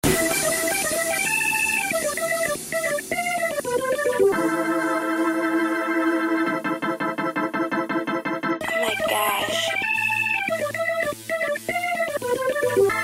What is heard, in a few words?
Instrumental